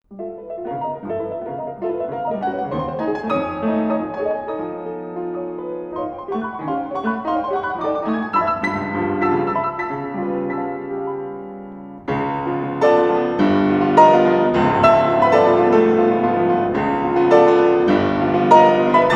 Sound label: fortepiano